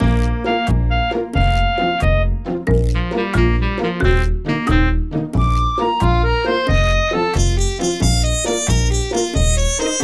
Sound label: gag shop